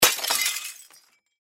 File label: Crash Glass Smash Crash Object; Smashes; Sound Effects:Objects; Blastwave